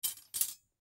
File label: Shopping Basket, Shopping Basket Metal Handle Drop; Shopping Basket Metal Handles Drop; Stores and Malls; Foley; Blastwave